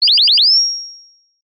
wake up tones; oringz pack nine

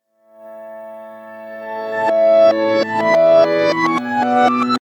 nokia tune reversed

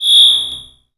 unknown floating device

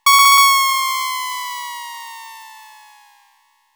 wailing; message tones

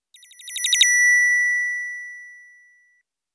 rising up, wake-up tones

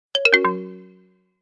my work is done, message tone

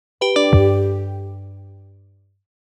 conclusive message tone; notification sounds

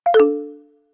case closed; notification sounds

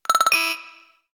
arrogant, notification sounds

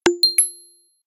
cheerful; notification sounds

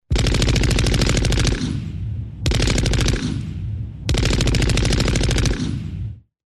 big gun; wake-up tones

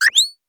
capisci, message tones

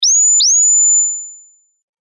wake up tones; oringz pack nine

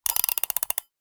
kitchen timer windup; sounds from the kitchen

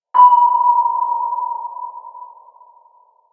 sonar ww2; hollywood

